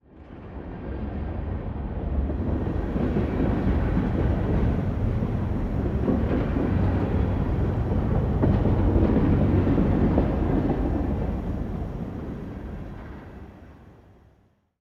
train distant pass; ambient

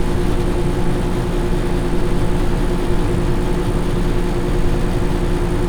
fan, ambient; Counter Strike Model Pack; CS:GO; nuke

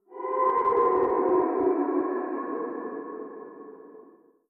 idle moan wet, zombie vox; creatures

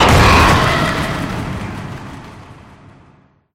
pigeon flock; scripted sequences; distillery

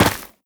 step run, footsteps, gravel

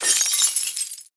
debris glass, bullet impact debris

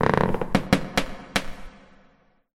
plank creak; scripted sequences

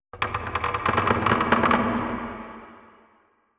ambient wood creak low

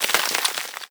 debris dirt, bullet impact debris

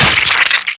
node damage; reviver

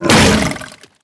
spit emit, barnacle creatures; Half Life Alyx

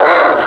pain, suppressor

